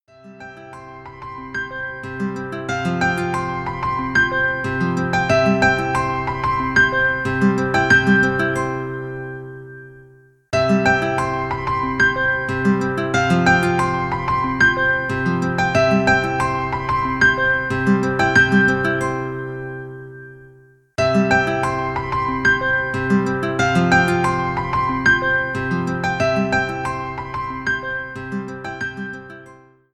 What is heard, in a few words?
Lg V60 Ringtone